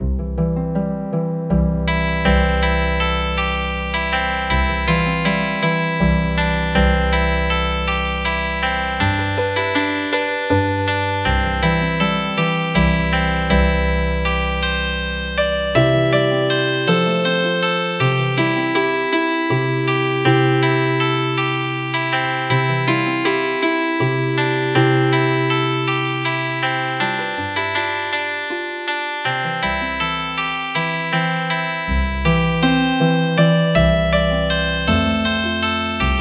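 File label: Healing Harp, traditional; The Campbells Are Coming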